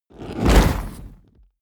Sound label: whoosh fire lava wave small